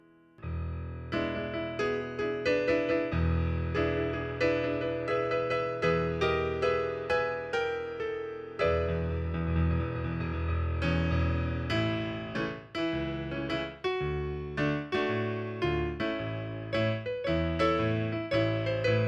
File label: backing track